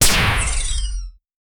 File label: sci fi weapon poison gr, explosives